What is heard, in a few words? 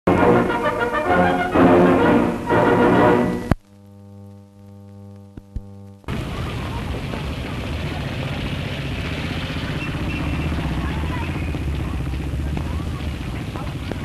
German march